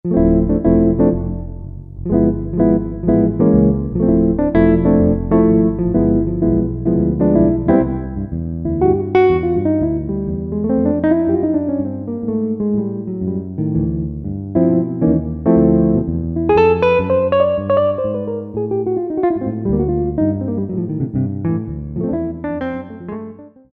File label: Jazz Guitar, Roland SR-JV80-01 Pop